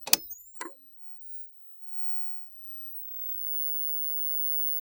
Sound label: old tv turning off; power off